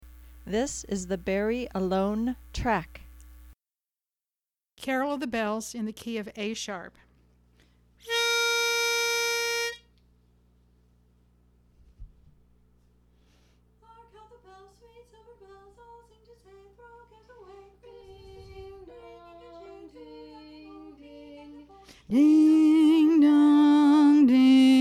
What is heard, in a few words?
Carol of the Bells Bari Alone